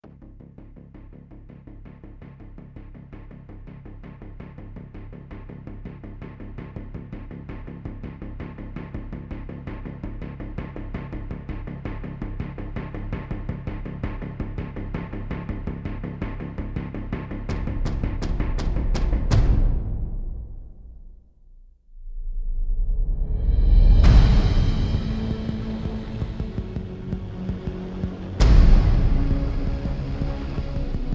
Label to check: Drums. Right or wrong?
Right